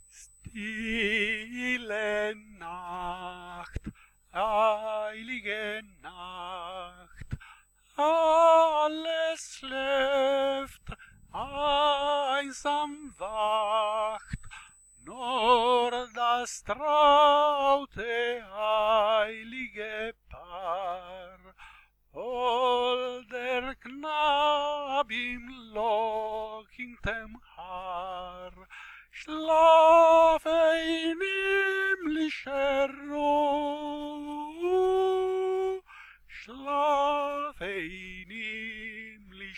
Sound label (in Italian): Stille Nacht - soprani; Natum de Maria Virgine